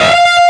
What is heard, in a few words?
bend full; osu